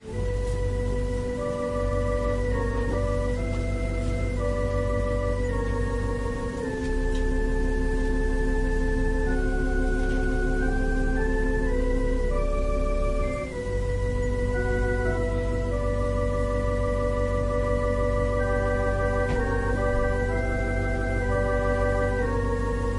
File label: Organ and violin prelude